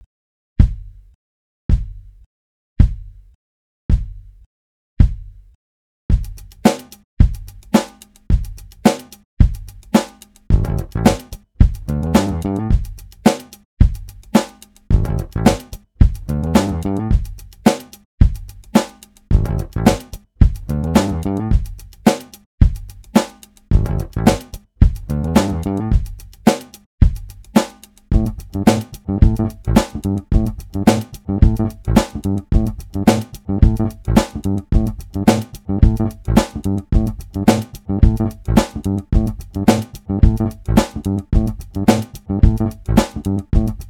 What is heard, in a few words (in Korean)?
기타 반주 루핑